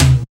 Toms

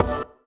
B STAB, funky; Loopmasters; Funky Underground Vol 2

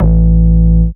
Bass Shot A

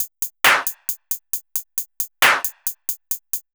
135 DRUMLOOP; Breaks; Loopmasters; Keith Mackenzie & Fixx Present Booty Breaks Vol.2; DRUM LPS

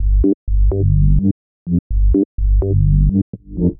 126 G BASS, Funky Underground bass loops; Loopmasters; Funky Underground Vol 2

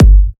Bassdrum

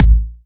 Techno Kit Bassdrum; Mutekki Media; Vengeance Electroshock Vol.2

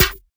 Percussive

